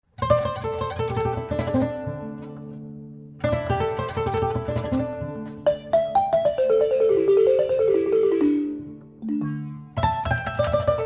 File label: balafon